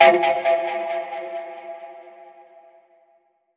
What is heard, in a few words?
Past Life Warning Ping